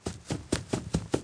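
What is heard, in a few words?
run left to right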